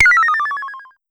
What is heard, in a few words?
Pollard Syndrum